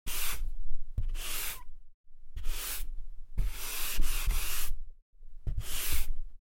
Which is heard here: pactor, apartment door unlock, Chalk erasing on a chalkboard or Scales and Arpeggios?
Chalk erasing on a chalkboard